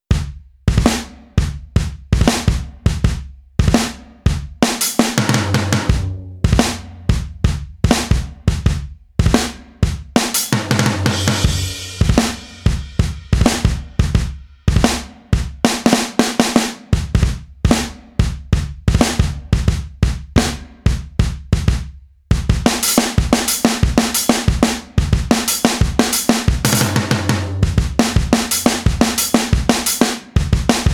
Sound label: Addictive Drums Test; organic io